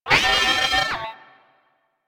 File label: Leech Attack Spotted, Dialog; Leech Osprey